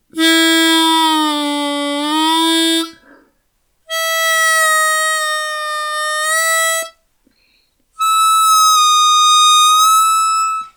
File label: MINOR HARMONICA magnet tuning blow; Seydel